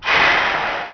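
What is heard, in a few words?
Emergency Brake, Train; OpenBVE; Expreso CIWL Fleche DOr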